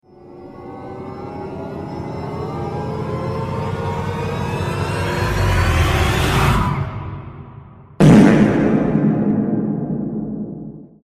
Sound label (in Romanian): epic pierd